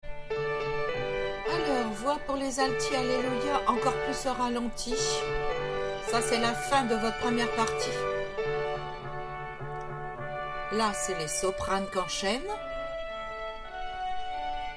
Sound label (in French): halle alti